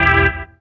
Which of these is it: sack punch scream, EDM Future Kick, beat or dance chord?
dance chord